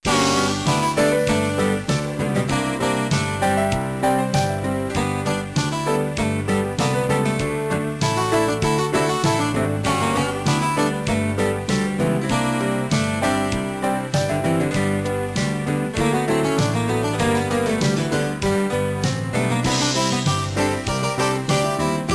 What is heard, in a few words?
Country; mavis beacon teaches typing 15 windows gamerip 2002